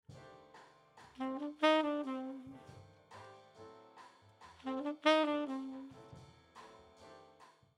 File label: Sax HPF LPF Comp; Mix Proc